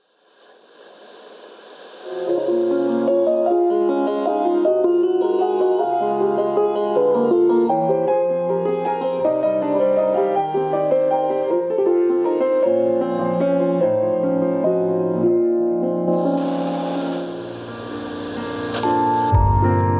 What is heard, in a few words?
Instrumental